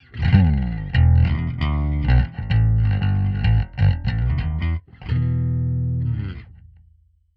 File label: bass wet